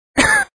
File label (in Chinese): 女生 咳嗽; renlei